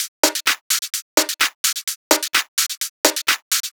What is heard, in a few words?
tops 128; Anthemic.Electro; tops loops